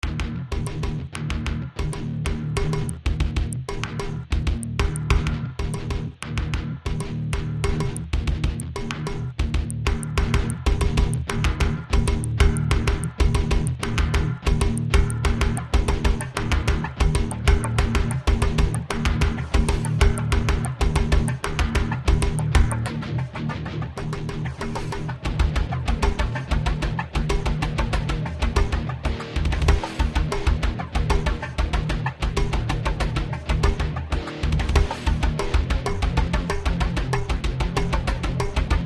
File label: Samba Metal